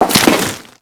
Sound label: Crewman Land Dirt, footsteps; Enemies; Warframe; Corpus